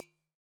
Udu Slap

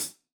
hihat closed, gretsch kit

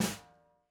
snare drag, gretsch kit

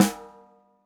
snare, gretsch kit

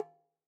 Udu Skin Pressed

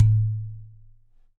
Udu High

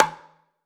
Udu Skin Pressed

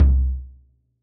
bass drum, gretsch kit

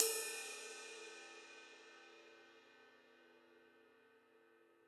ride, gretsch kit

